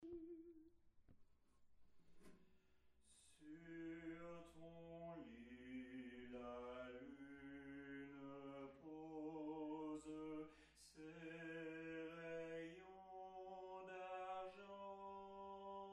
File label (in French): Berceuse basse